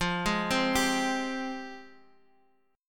F Minor Add 9th F; arpeggiated; guitar; JGuitar

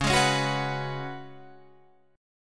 C sharp 7th Suspended 4th C sharp, Guitar Open F; C#7sus4; strummed; tuning=Open F; guitar chord

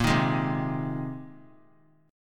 A sharp 7th Suspended 2nd Suspended 4th A sharp; strummed; 22,22,23,22; chord; JGuitar

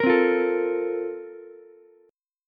Bb Major 7th Suspended 2nd, Ukulele; BbM7sus2 strummed; JGuitar

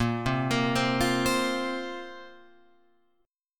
A sharp Suspended 2nd down Guitar Open F; arpeggiated; A#sus2; JGuitar

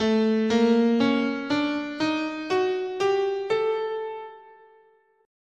A Oriental, scale

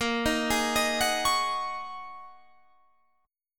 G sharp 7th Suspended 2nd Suspended 4th

